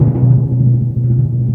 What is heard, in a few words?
Timp FX, Orchestral Perc; Roland LCDP03; Ambo TimpRol